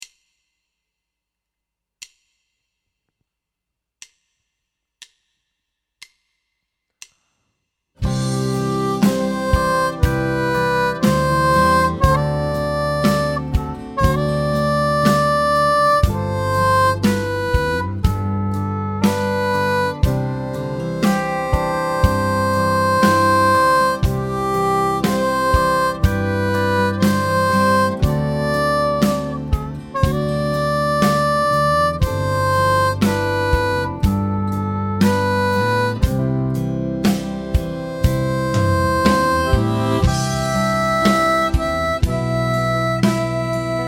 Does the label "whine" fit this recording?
No